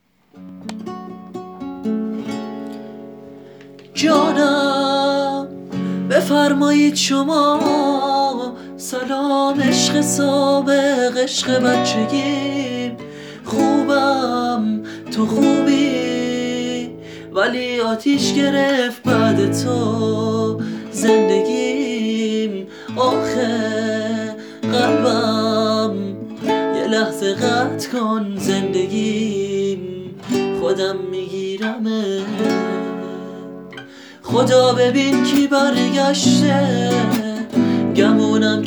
Gitar

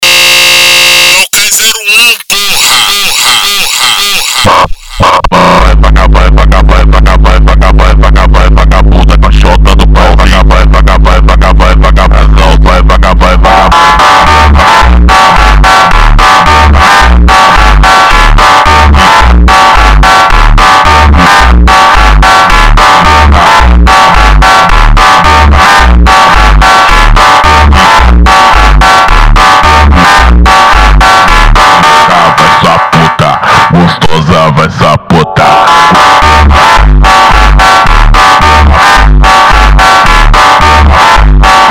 FUNK Slowed

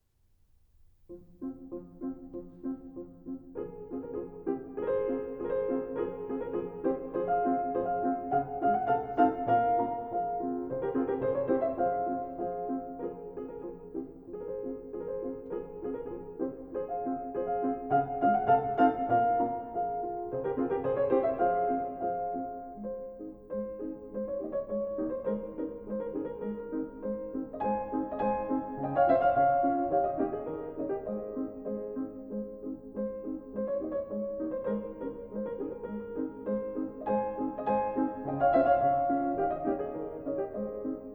Piano

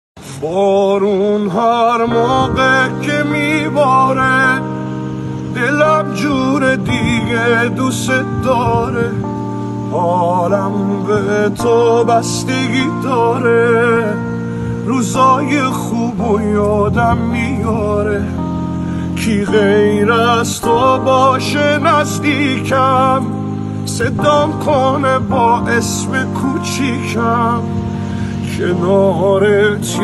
Piano Version

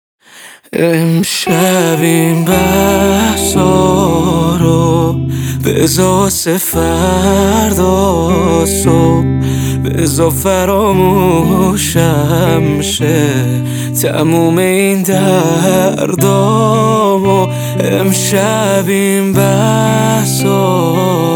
Guitar Version

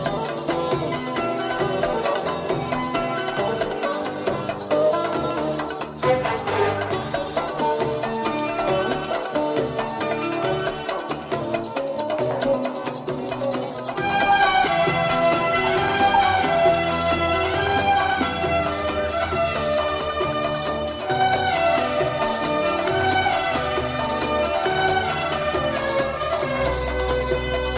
Rington

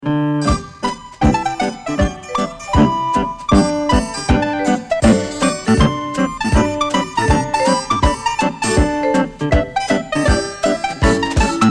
Instrumental

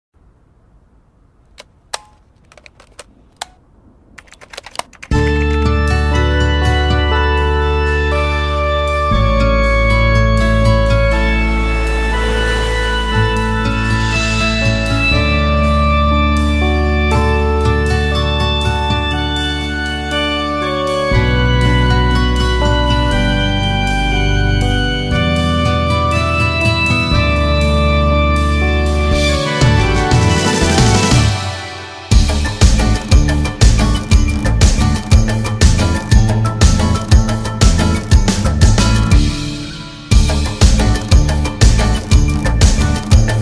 Instrumental

